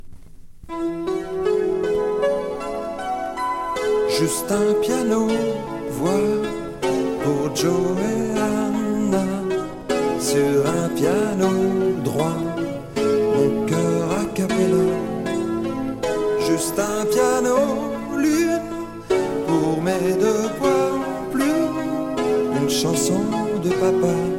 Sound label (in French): Piano voix